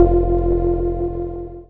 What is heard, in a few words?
Lead, 8-Bit; Games And 8-Bit Kit Volume2; atomsplitteraudio; chiptune